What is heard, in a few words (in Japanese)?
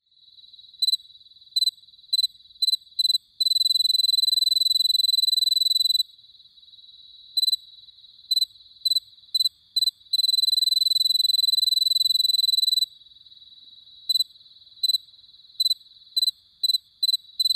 kin hibari